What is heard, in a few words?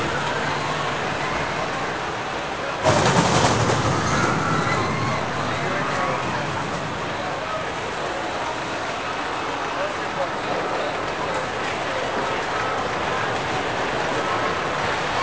amusement, Ambient; MX vs ATV Unleashed